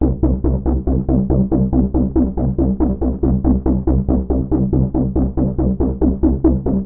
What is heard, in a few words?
Trance Bassloop 140bpm, Bass Arps; Zero-G Trance Inducer; 90 s Sample CDs